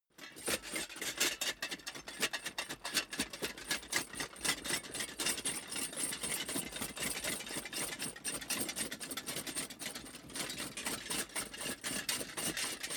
troops metal clatter run

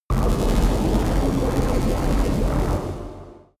space underwater machines

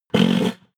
horse snort short

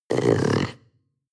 panther young grunt deep short

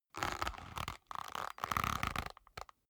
leather creak soft

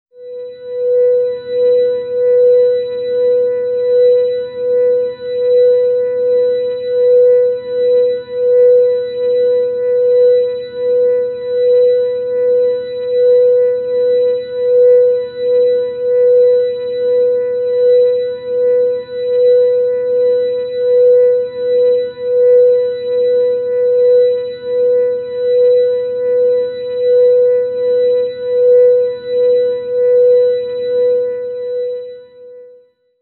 organ tone b

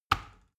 plastic button tiny push heavy

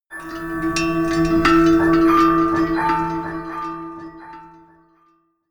melodic odd pad bell high

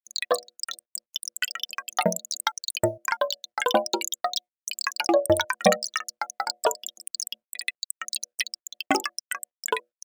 grain

